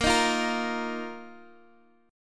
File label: Bb Suspended 4th, Bb, down, Guitar, DADGAD; strummed